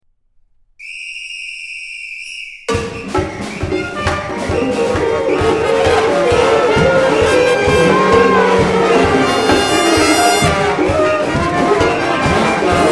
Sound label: metalofonico